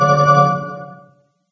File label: phone ring callee; There; ThereClient